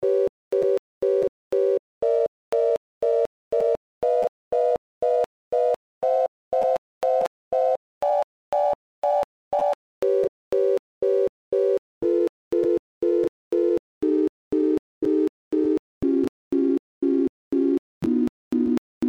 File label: sinewave